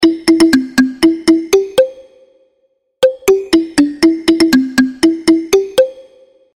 Perfect Melody

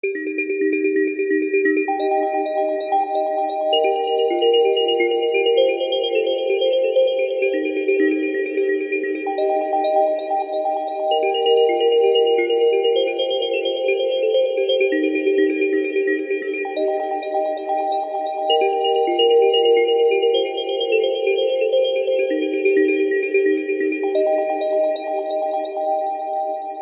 Dream Bell Ringtone

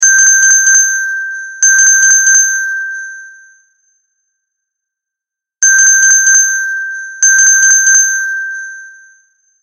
Office Phone

